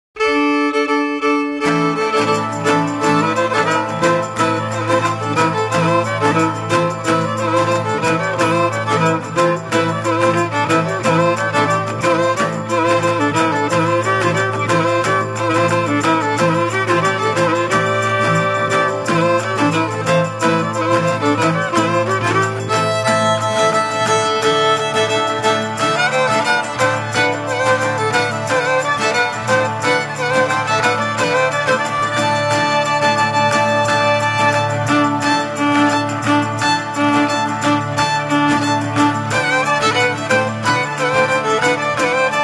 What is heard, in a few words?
Ikarian Dance with Bagpipe